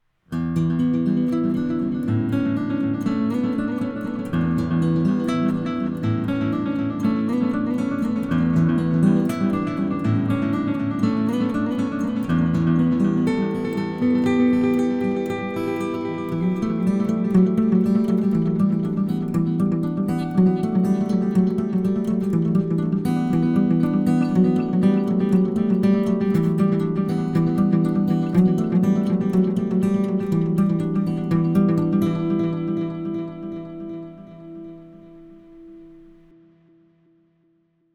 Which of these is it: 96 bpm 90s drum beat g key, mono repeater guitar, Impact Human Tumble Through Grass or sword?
mono repeater guitar